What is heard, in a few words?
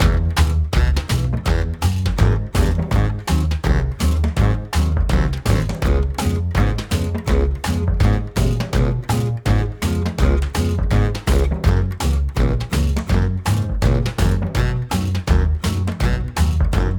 Background Loop; Civic Doodle; Jackbox Games; The Jackbox Party Pack 4